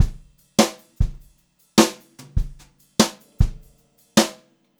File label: Brushed Drum Loops, JAZZY